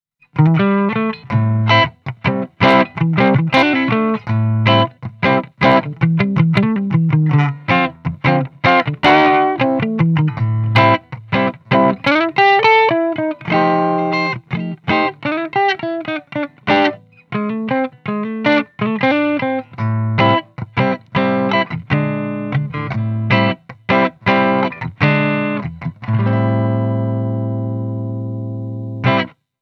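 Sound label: Clean Guitar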